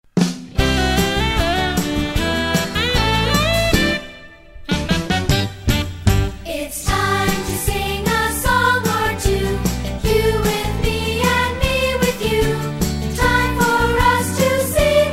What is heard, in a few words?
time to sing vocal